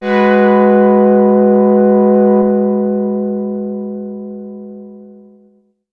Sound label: Strictly Synthe, Excessive Stereo; E-MU Producer Series Vol. 6 – Analogia Project